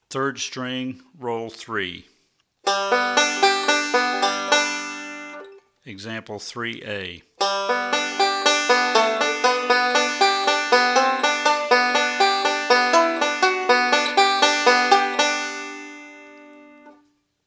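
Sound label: Rolls For Melody On 3rd String, banjo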